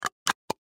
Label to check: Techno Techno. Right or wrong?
Wrong